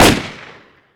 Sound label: ak74u shot, weapons